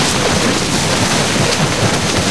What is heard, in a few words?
Fishingnet; Finding Nemo; XBOX